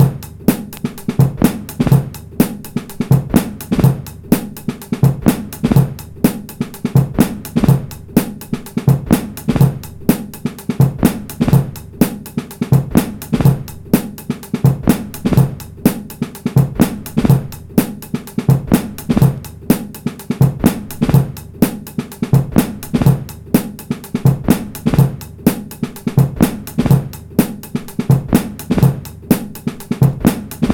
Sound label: BOOGALOO 125 bpm; Planet of the Breaks